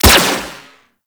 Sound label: Dispersion Laser Fire; Warframe; Corpus; Riot Moa